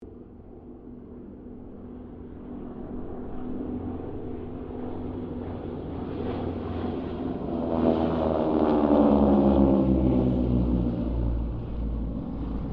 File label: Harvard squadron 4 pass, stereo; Mixed aircraft squadrons; Airplanes; Sound Effects:Vehicles